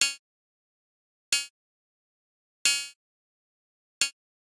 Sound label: Generic Click Digital; boom library; The Interface